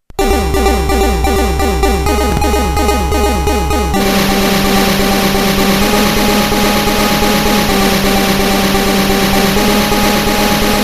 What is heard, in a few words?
NOISE Interlude; lolicore